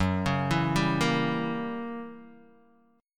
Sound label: F sharp 7th Flat 9th F sharp down Guitar Open D